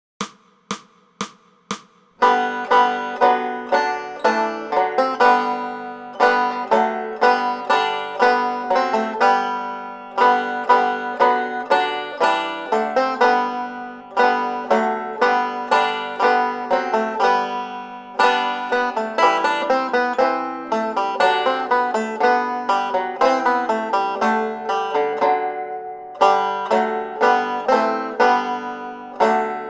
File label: Banjo; Angels We Have Heard on High; Banjo Tablature with Play-along Audio Tracks